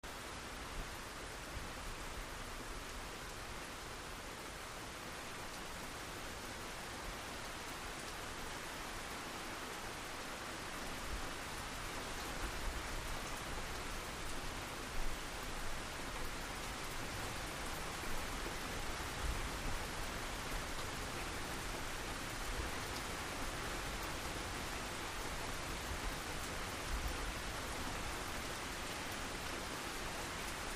Rain; Light With Plops On Leaves.; Medium, Steady Rain With Plops.; Rain, Drizzling

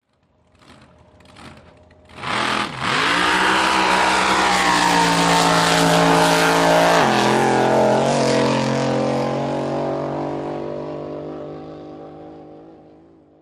Drag Boat Idle away

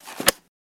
Book Page Turn

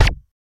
Gun Shot Silencer, silenced singles

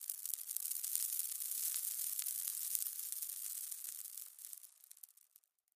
Dust Debris, rocks & dirt

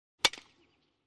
Aluminum Bat Hits Baseball; baseball fx & games

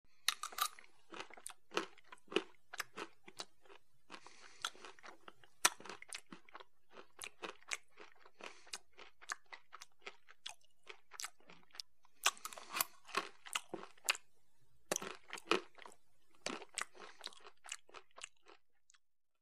Eating Fork Scrapes, chewing

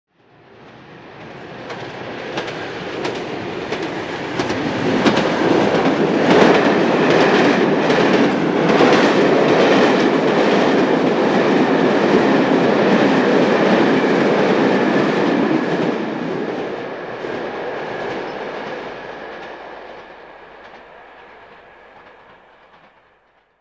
Electric Train Fast Past

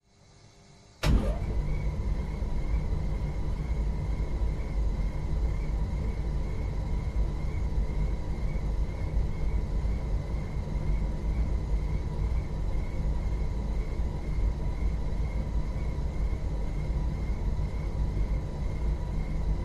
Industrial Ventilatio, factory, fan

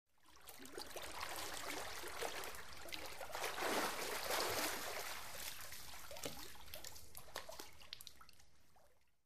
Swimming; Swimmer Getting Out Of Pull.

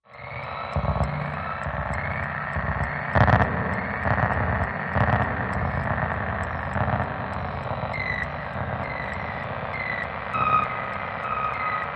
Meter Machine, static

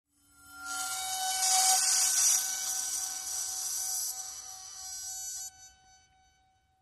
BBs Vibrate In Bowed Bowl As It Is Bowed; bowed-metal

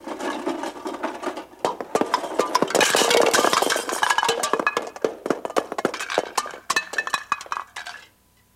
Cups Things Fall, ransack room; Cups and Things Fall

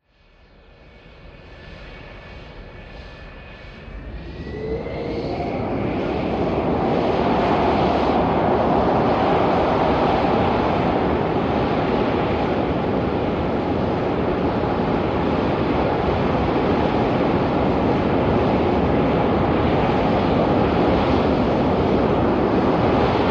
F4 Phantom Taxi Idle

